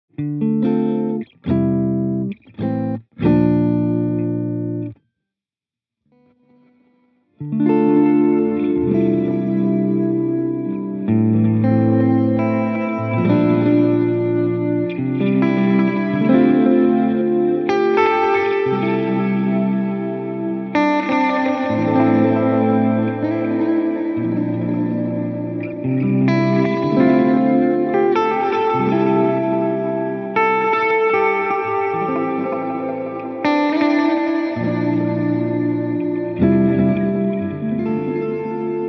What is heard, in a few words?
Ambient